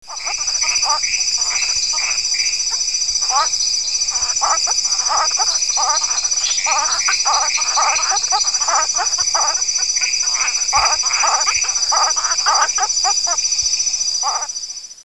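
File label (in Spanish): Ejemplo de sonido, Ranas y Sapos de Bolivia; Editado con Alosa en 2002.; Doble CD Audio editado por R. Márquez; Fonoteca Zoológica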